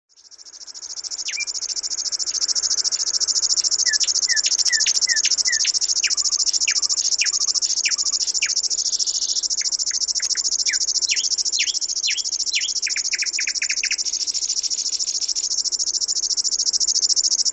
VIITASIRKKALINTU; LINTUAANITYKSET

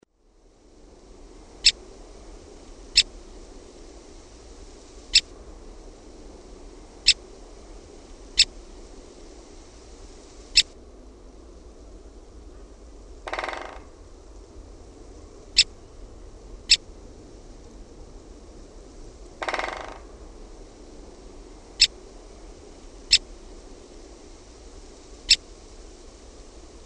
KAPYTIKKA; OMAT LINTUAANITYKSET

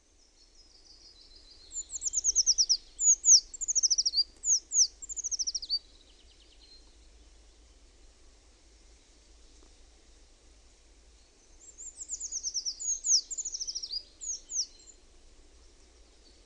PUUKIIPIJA; LINTUAANITYKSET

